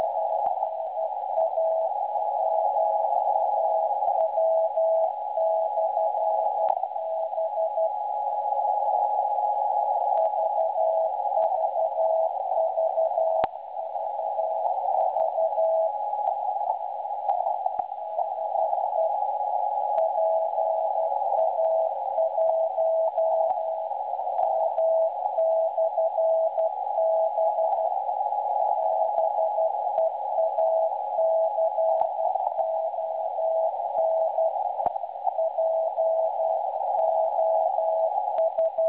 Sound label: websdr austria 3579.1kHz, Beacon recordings